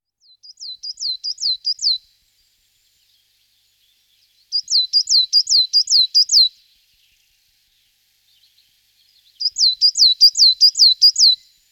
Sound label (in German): Tannenmeise, Voegel; Periparus ater